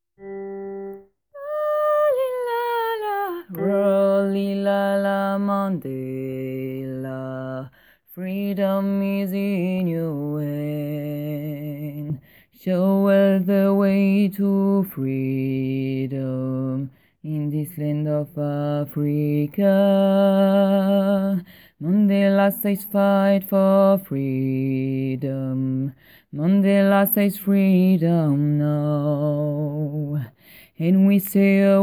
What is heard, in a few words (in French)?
Freedom basses